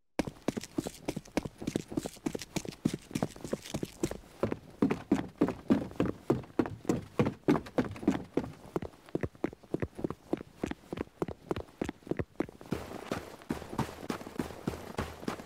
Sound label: counter strike walk